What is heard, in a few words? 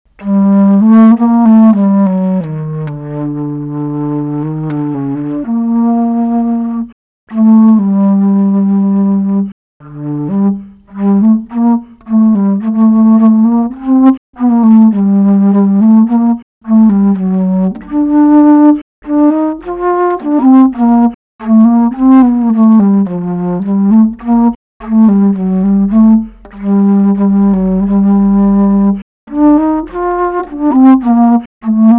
bass flute